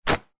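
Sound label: foley clothing dress soaked wet drop wood deck; zapsplat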